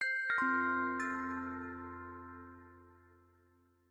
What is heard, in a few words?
Power Off In Call; LG Optimus 2X SU660